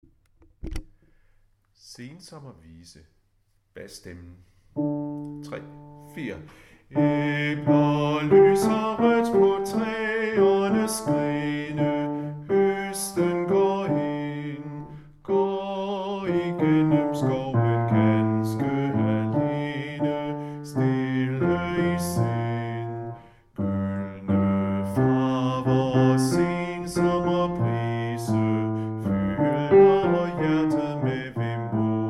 bas; harlev koret

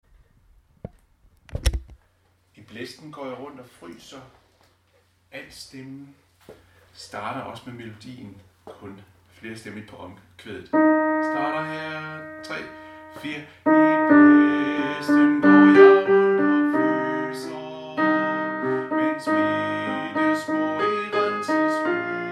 I blæsten går jeg rundt og fryser alt

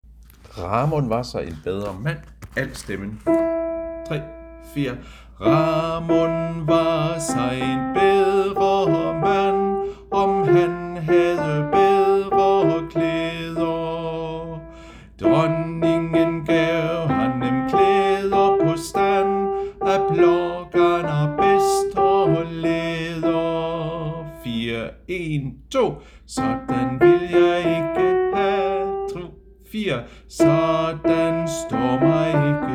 alt, koret

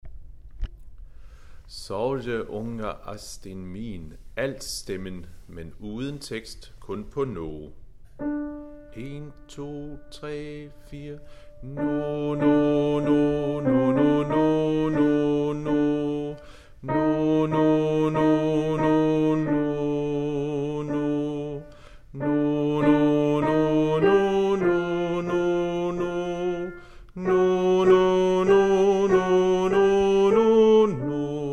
Sov min lille (islandsk) alt; harlev koret

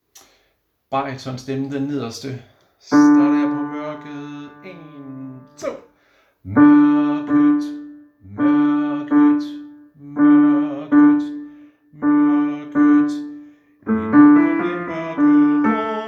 bariton; harlev koret

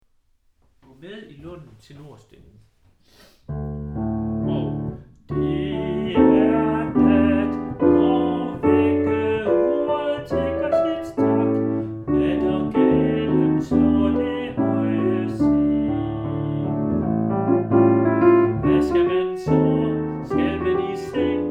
tenor, koret; Gå med i lunden; harlev koret

